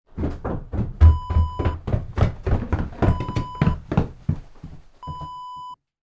footsteps wooden stairs down

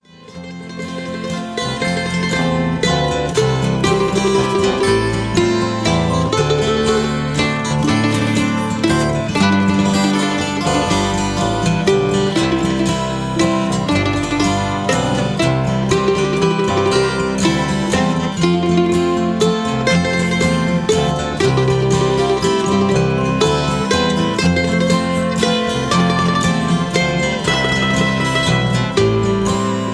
Irish Songs, Key C

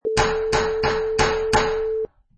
Door Knocker Hits

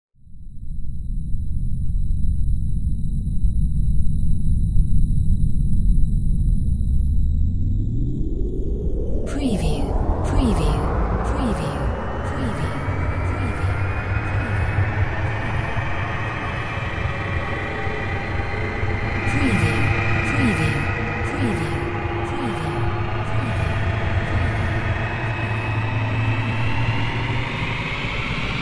SCIFI SPACE VEHICLE LAUNCH

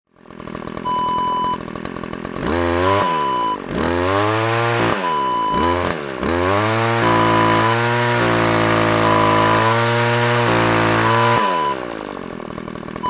string trimmer

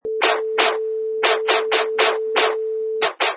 DJ Record Scratch

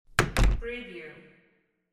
door close

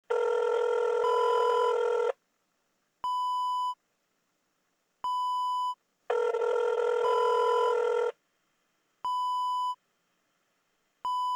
phone calling

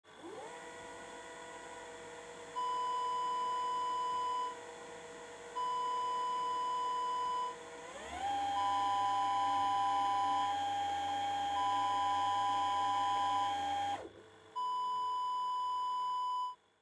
vcr ff

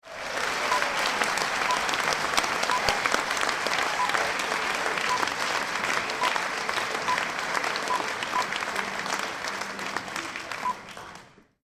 Applause

